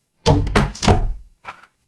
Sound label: door open